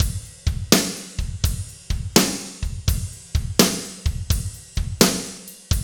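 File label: slow shuffle, Roland drum beats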